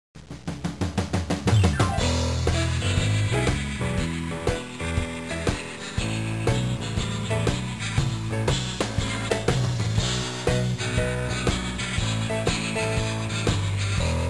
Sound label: Music Bowling; Spy Fox 3 Operation Ozone